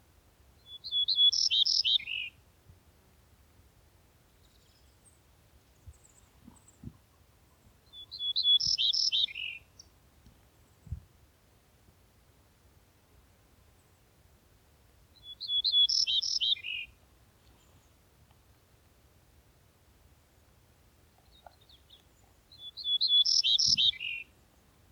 Grey-necked Bunting

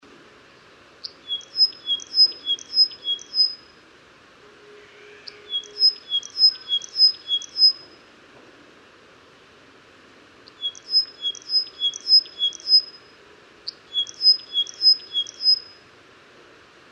Forest Wagtail

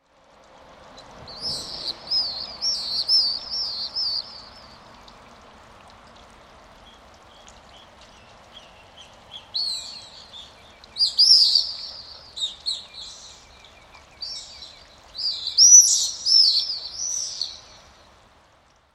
Pallid Swift

